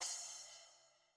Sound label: Ground Middle Hit Small; FieldBattle; the legend of zelda tears of the kingdom